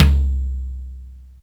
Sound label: high tom one shot c key